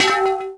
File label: bouncy big plant hit; bouncy objects; Tony Hawk's Project 8; XBOX